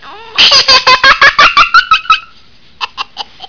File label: Giggles